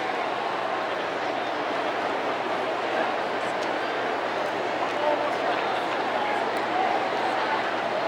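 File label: baseball crowd ambience loop; Crowds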